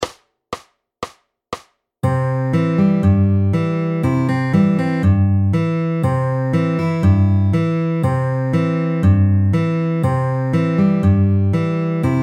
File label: In Betweens quarters FAST